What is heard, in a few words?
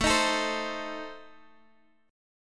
A Diminished A Guitar Half Step Down, chord